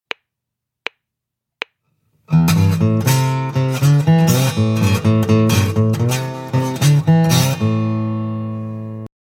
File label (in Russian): Слайд гитара